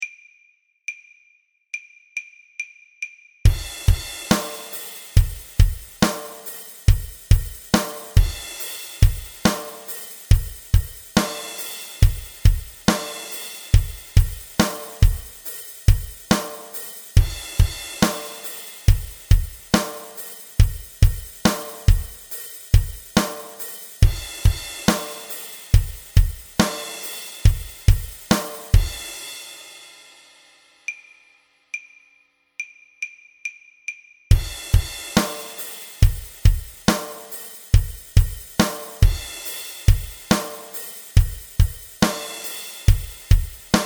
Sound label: chorus; Drum Lessons